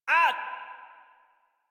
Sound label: Pain, Barks, Battle; Warframe; Baro Defense